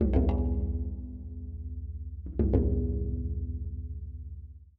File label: Planet Tracks, Minimal Planet Drums; Ambient Cinematic